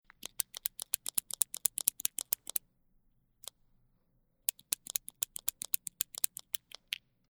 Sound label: mechanical pencil click